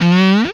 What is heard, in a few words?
Sci Fi Gun, Blast; Space Blasters2D